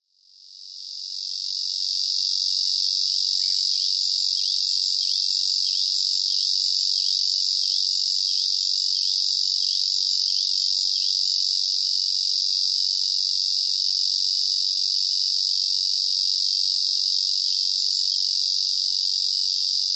ezozemi

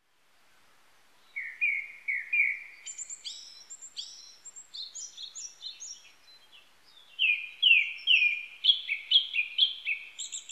kurotugumi

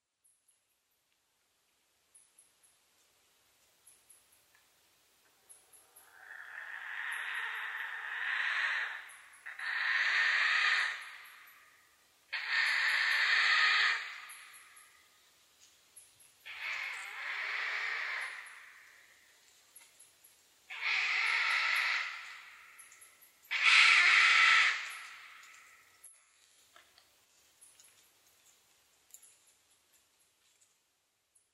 fukurou ugya

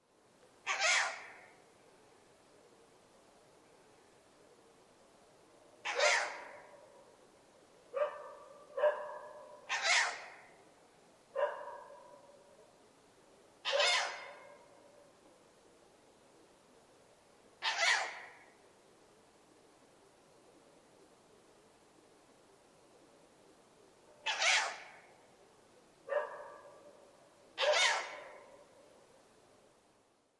fukurou

